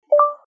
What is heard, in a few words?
mic on